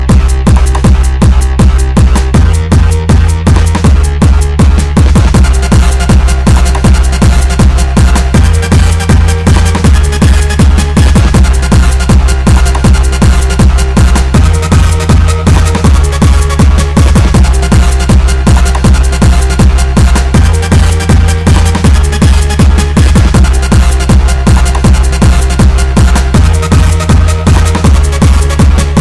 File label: Hard Electronic Bass